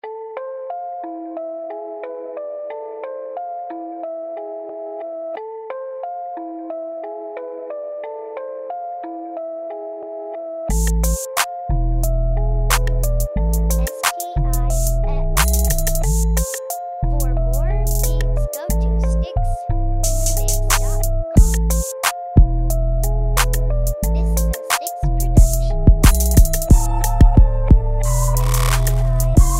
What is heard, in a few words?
Tick Tock